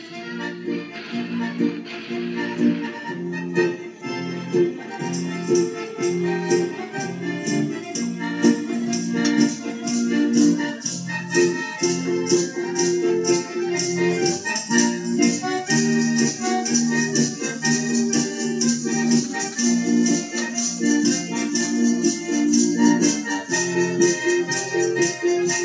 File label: Polka